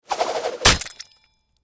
Ninja Star Throwing Star Martial Arts Impact Human Flesh; Warfare; Miscellaneous Combat; Blastwave